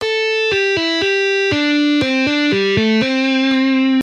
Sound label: Rests example